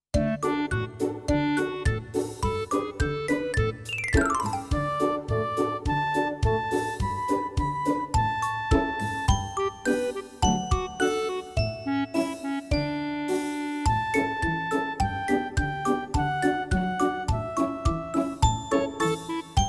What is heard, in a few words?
Melody